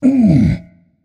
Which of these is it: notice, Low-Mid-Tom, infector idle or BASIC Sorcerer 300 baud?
notice